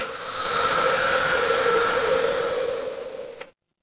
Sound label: mechanical machine